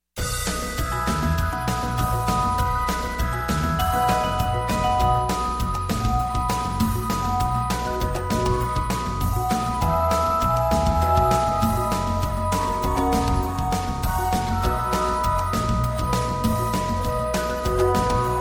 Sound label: Jingle Bells; Christmas